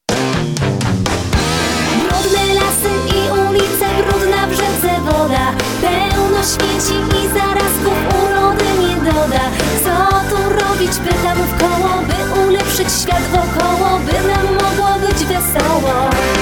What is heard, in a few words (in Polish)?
eko rock n roll